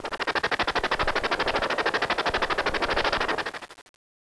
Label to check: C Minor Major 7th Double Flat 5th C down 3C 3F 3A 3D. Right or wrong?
Wrong